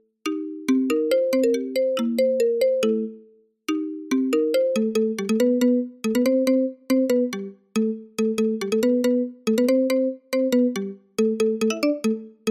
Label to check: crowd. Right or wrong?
Wrong